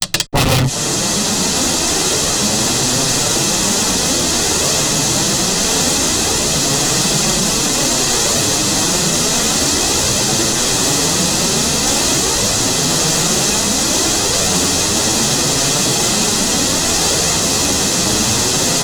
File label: Ejection Seat; quantum runners windows gamerip 2021